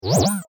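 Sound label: Science Fiction Dangerous Laser; star wars / trek style games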